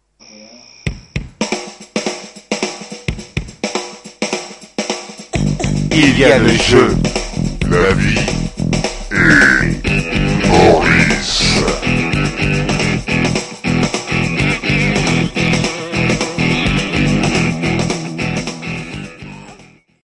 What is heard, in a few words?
jingle